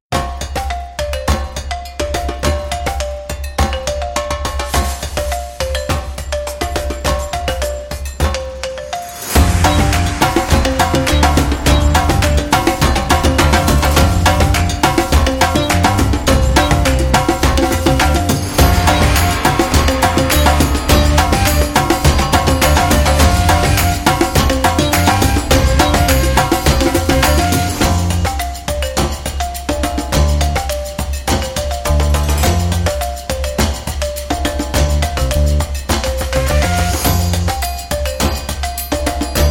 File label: African Travel, African Tribe Music